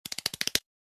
walking

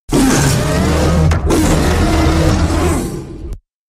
king kong roar

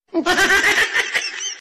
funny frog laugh

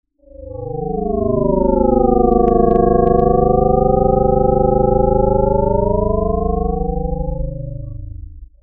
roaring; metis

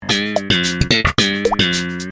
sitcom transition bass riff; seinfeld

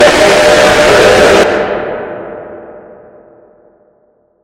jumpscare sound; robotic life 2 0

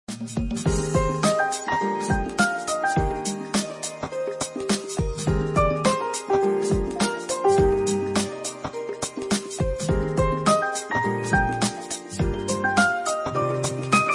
music

